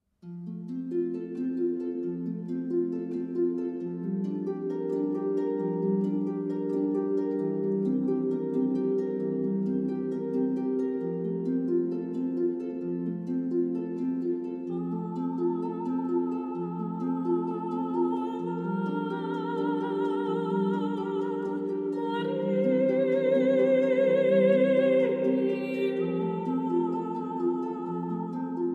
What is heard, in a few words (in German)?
Ave Maria, Harfe